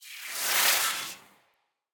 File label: tiny transitions; sonniss gdc